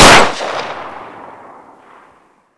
revolver shot

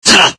pain, paralyzed subject

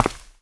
Footstep Generic; Guard

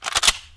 shotgun start

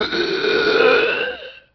zombie idle, male

